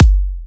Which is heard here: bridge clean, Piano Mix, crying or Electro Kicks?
Electro Kicks